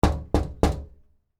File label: Door Knock Glass, Foley, Door, Knock, Glass, Storm, Door, Hard; Door Knocking; BlastWave